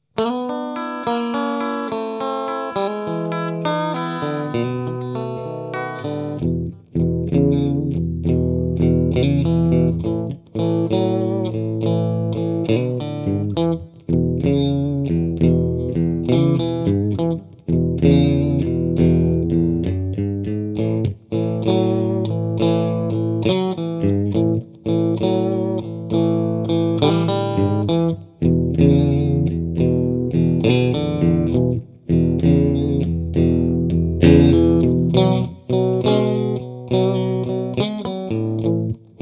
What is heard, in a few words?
rock rythm, guitare